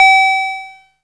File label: OC-SQ BE G3, SYNTHESIZER